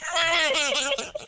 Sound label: Wheeze